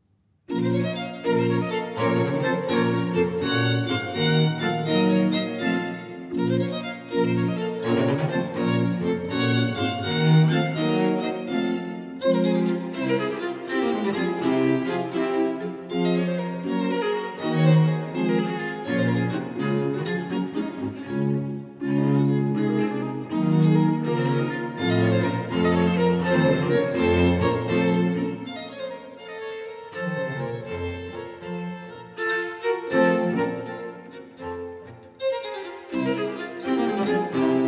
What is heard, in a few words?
Sonate 8 in C minor - 4. Vivace; Archangelo Corelli; Arcangelo Corelli; Corelli - Complete Works; Klassika